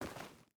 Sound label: footstep boots stones walk; Rust